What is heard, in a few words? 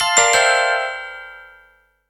alert